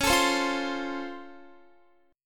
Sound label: Db Augmented Major 7th, down, Guitar; strummed; JGuitar